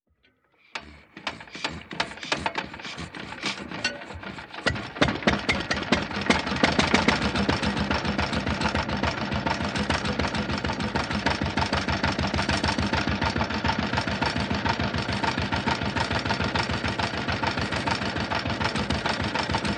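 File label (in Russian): Одноцилиндровый двигатель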